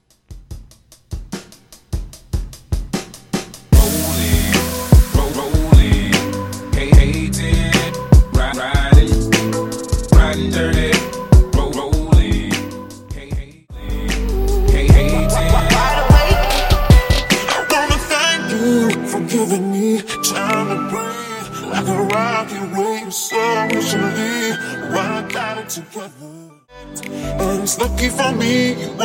Dubstep Trap

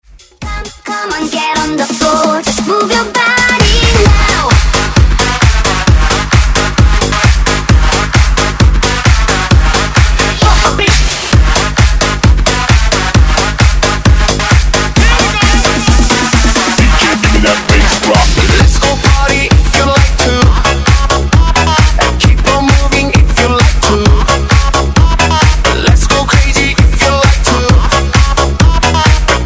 Turbotronic Dance Redrum

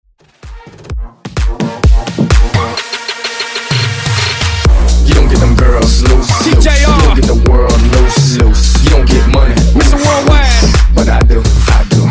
Bootleg Dirty 128 Bpm

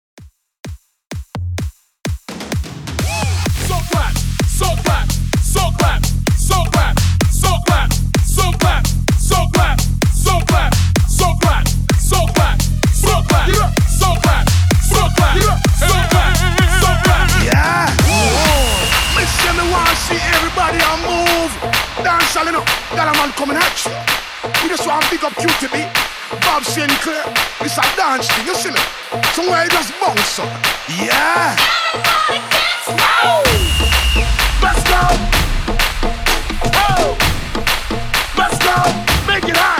Bootleg Mash 128 BPM

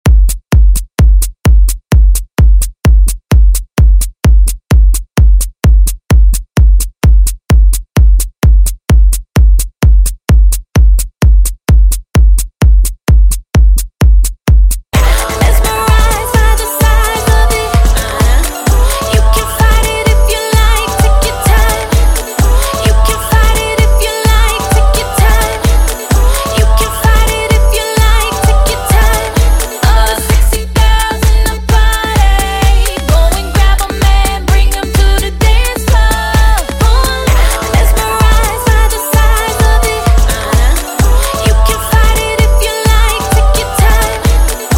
Hip Hop Hook; Dj Intro Outro; Redrum

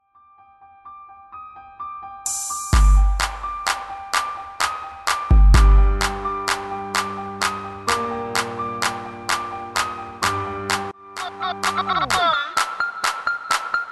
HALLOWEEN INTRO, 64 BPM

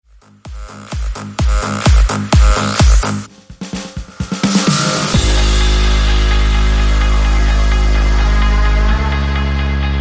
Top40 Bounce Dirty

